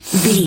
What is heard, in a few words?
voice male cry snort